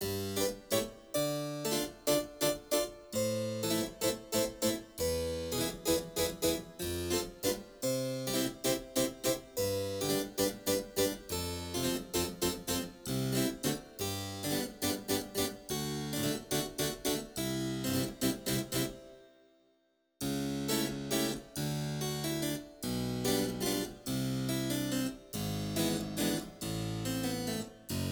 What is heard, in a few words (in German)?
Walzer